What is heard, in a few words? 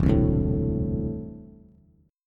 E 7th Suspended 4th Sharp 5th E down Bass; strummed; chord; JGuitar